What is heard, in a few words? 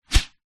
sport tennis racket swing whoosh; zapsplat